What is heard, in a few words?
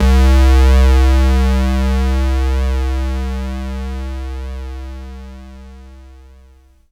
Juno106 Square Vinyl Synths C1